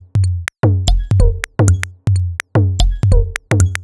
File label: 125bpm, House Drumloops